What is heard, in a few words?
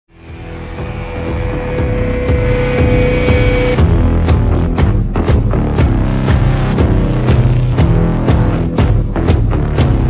dubstep remix; gi joe retaliation soundtrack